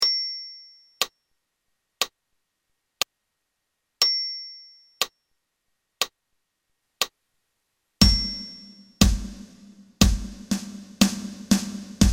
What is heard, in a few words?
Percussion with count; Duke; brass; backing tracks